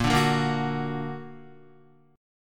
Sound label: A sharp Augmented Guitar; A#+ strummed; JGuitar